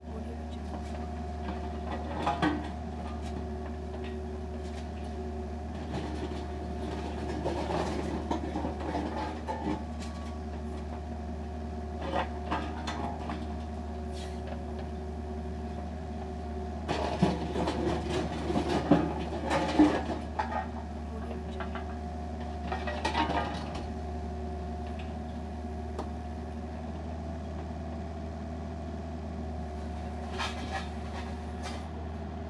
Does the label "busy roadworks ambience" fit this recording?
Yes